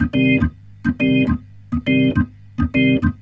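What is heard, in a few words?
organ, Roots Reggae; B-3; Sounds Good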